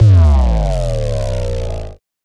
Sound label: Bass Drop; SoundsCrate